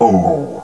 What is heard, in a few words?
ooh; caged